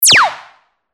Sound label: Laser; revealed techno fx vol 2